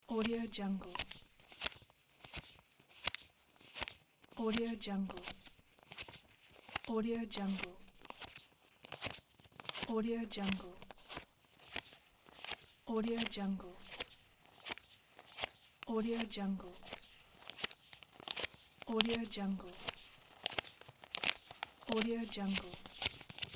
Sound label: banknotes counting